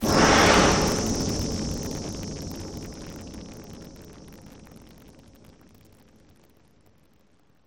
fire sound effect

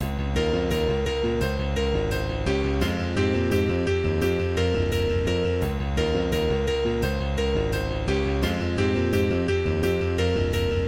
piano

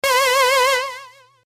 laser

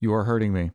pain

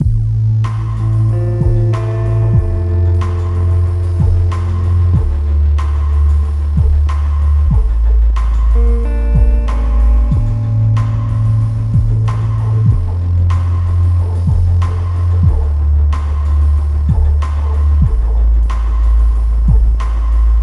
Trem Loading loop

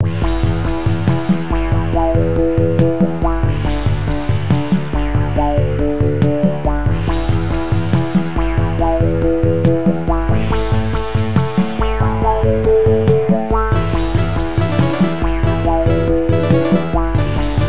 desert travel

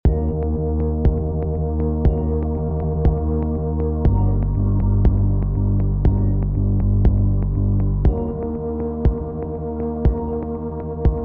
short loop

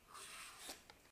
peel pumpkin

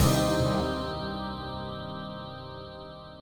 heartfelt battle outro

